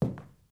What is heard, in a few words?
Soft Foot Step